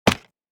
Platform wooden game menu ui select

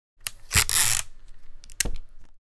Scotch tape dispenser handling pull and tears

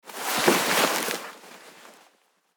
Fabric material cloth shuffling

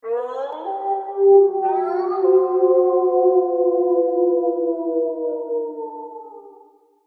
Wild pack of wolves howling; wolf

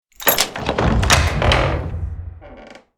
Cinematic door and lock open; household

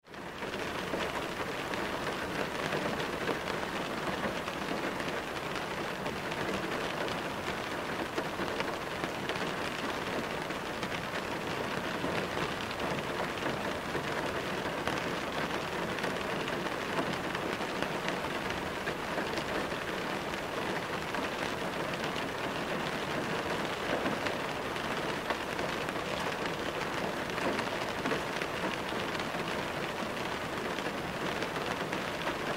Rainfall car interior perspective

